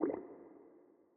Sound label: zone gas blop